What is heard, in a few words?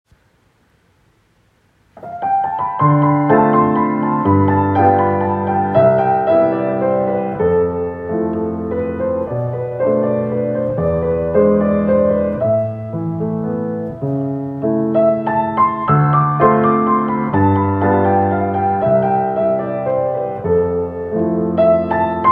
piano